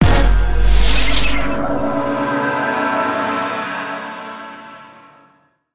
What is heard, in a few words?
pong